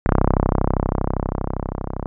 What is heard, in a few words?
TB 303